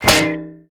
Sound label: Idle Head Impact; Warframe; Fortuna; Zuud